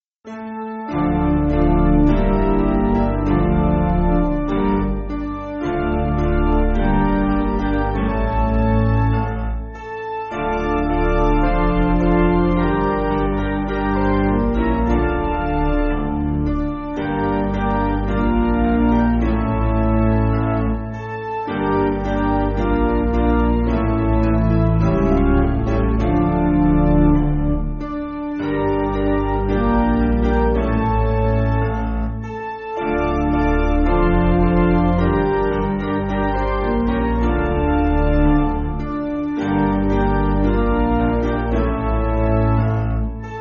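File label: Piano, Backing Files for Churches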